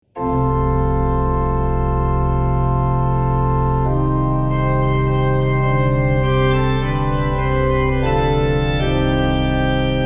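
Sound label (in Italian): corali, organist; 2) Ein feste Burg ist unser Gott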